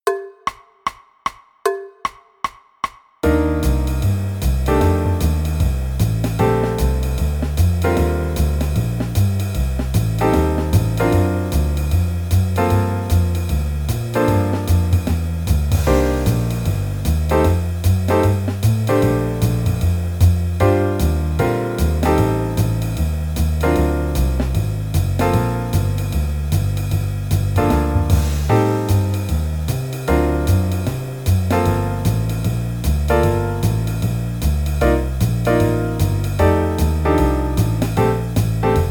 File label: Etude full accomp